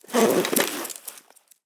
inv sleepbag; STALKER; IWP

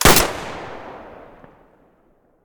muzzle hpsa stereo, weapons

